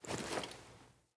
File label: Foley Action Default Ode Arm Parry Riposte; Player